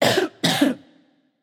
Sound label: clear throat; smite; skadi